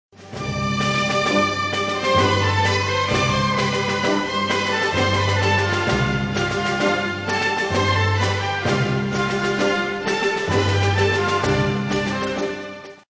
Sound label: Music In Style